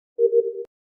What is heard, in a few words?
multi stage task partially completed; among us